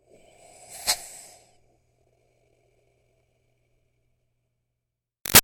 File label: Steam Release Iron Chuff Light Breath; Miscellaneous Household Sound Effects; Big Room Sound